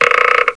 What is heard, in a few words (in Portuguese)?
ESTICA; 7invasores